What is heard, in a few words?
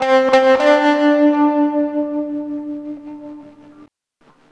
FX04, FX guitar; USB Soundscan vol.31; Miro s Grooves; AKAI